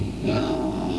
burp; the burping contest

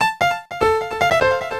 piano

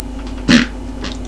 fart

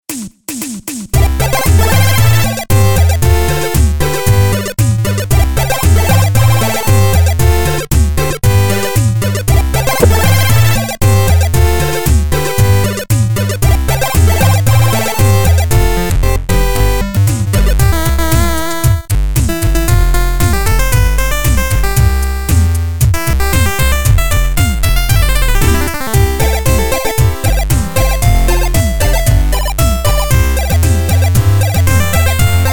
keygen

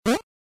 jump

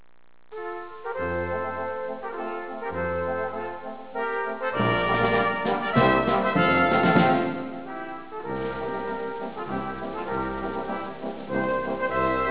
hymn

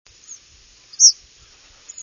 kingbird; birdsongs

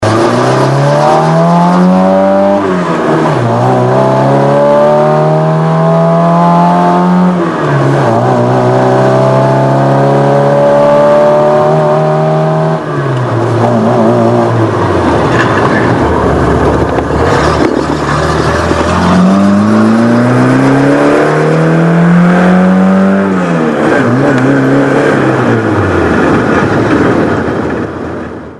Coupe, fiat

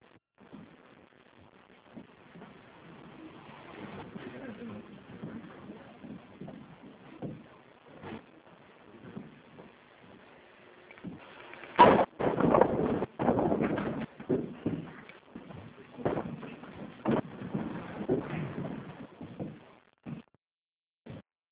shop gun

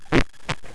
fart

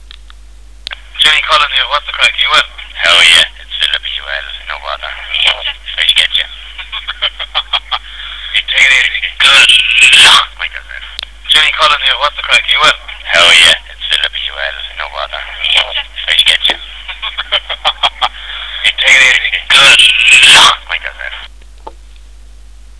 ringtone

